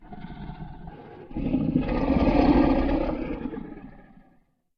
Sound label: Gold Dragon long and slow; SyrinScape; Vault of Dragons